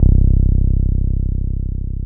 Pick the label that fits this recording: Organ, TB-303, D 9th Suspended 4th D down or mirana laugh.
TB-303